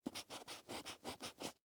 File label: Household Pencil Erase On Paper